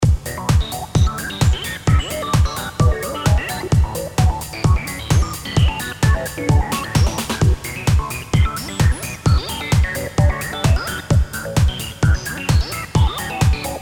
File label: Techno Grunge Looping